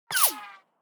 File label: weapons bullet small whoosh miss